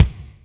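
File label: kick Tite Room, Alesis D4; 300 Drum Machines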